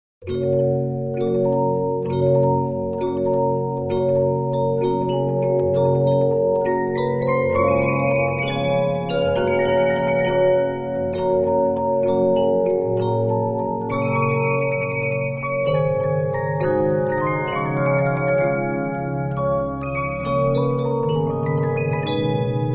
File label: Regina, 15inch disc musicbox